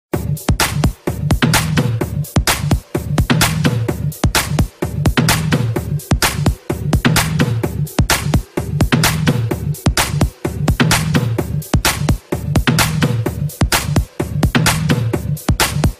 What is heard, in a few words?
Great Drumline